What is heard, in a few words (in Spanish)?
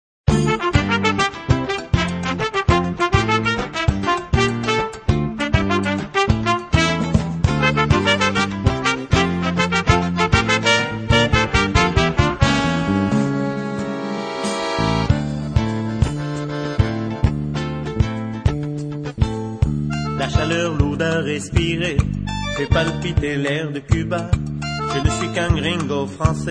salsa